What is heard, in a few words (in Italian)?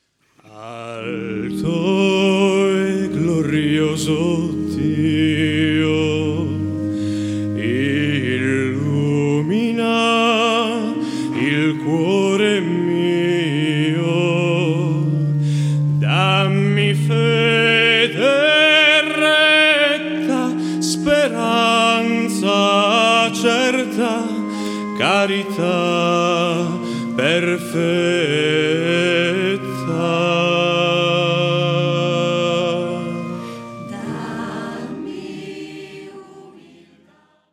coro; Coro Pacem in Terris